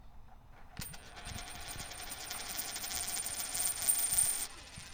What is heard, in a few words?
Coins Spinning